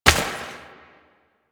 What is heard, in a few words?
Step Water